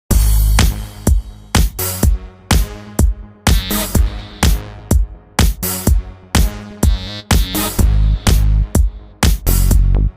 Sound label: race; Ferrari GT Evolution